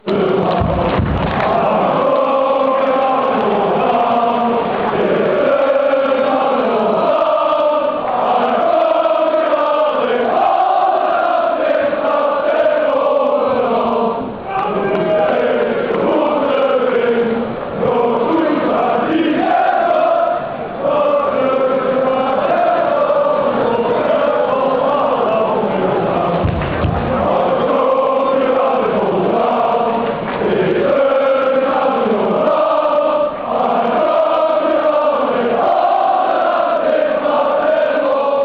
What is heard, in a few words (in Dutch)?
Gronings volkslied; grunns laid